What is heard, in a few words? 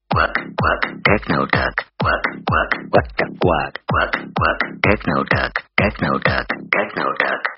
TECHNO DUCK